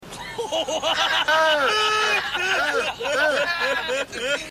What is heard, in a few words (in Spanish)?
risa nerds